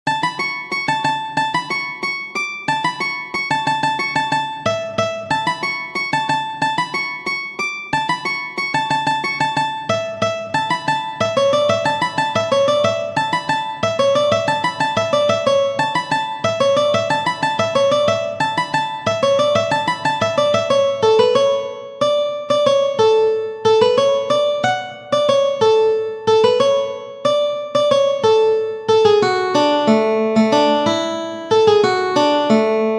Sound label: Harmony 180bpm, mandolin